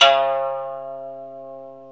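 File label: ethnic plucks, koto; Eth Plucks, Pluck Duo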